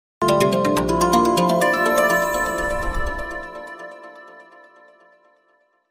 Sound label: call ringtone bgm